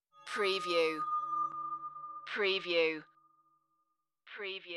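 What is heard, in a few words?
Creepy High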